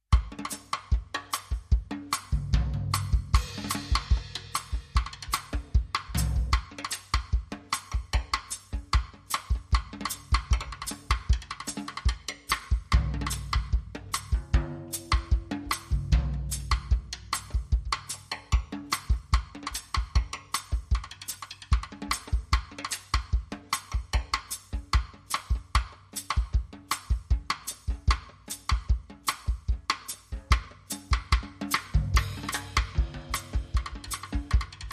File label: Rhumba Beat